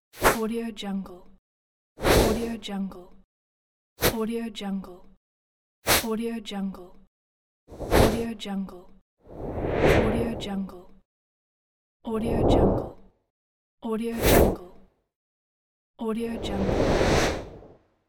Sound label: swish; wavbrulee